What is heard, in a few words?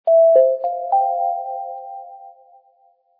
Upbeat, chime; ring2 chimepro bundle